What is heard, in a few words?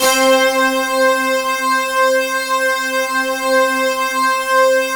BIG POWER, HEAVY SOFT; Sound Gallery - Charged